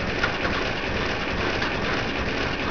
Tank Tracks; Greebles 1.0

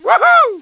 WOO-HOO!

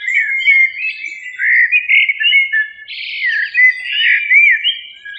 BIRDS, AMBIENT; RallyC; CD-Gamer 2000-05

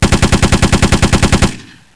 30 Cal MG Sound; Close Combat

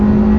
car engine; corrida; runout

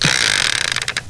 SPIN CYL

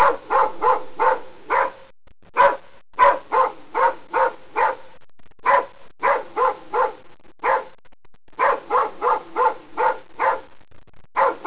dogs

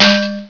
MetBonk

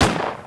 GUN; Death from Above

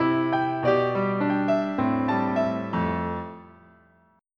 PIANO; akoffcomposer

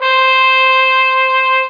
trombone C5; Star Trek JR Audio

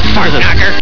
fart nock; Doom2

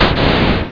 Magma; BOOM

